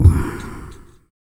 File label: PERC, MALE PERC; Spectrasonics Vocal Planet; Jazz FX